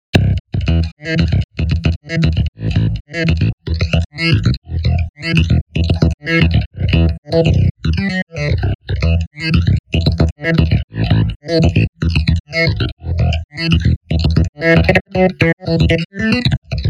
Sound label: hmm slap